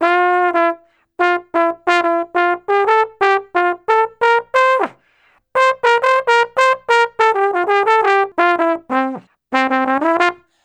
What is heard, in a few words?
Trombone Solo; Sonic Foundry (Sony Creative Software); Jazz Solos and Sections